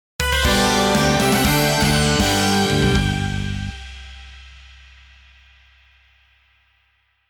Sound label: Fanfare